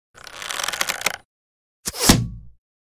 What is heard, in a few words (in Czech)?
luk vystrel